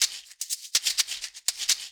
Percussion Loops, Shaker & Tamb; Houseworx

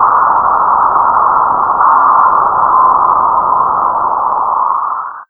BREATH BOX L, Big Beat Samples; AKAI; SPEAKS KIT

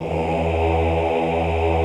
OHH E1, Classical Choir; Best Service; Extended Classical Choir

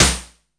SYNTOM LO; Back In Time Records; Big Bang

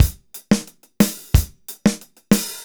180 DRUM, Mediteranean Traditions; Soundscan vol.53; AKAI

